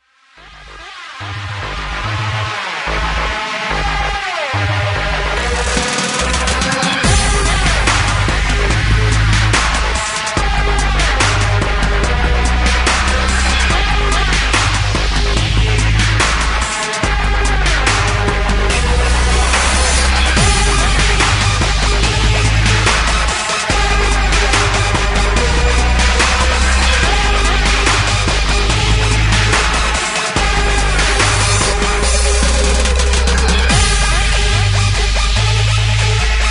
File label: dubstomp